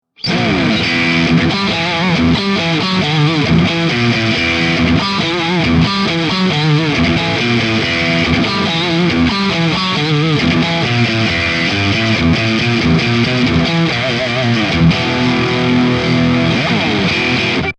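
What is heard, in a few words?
Metal Neck Mid, guitar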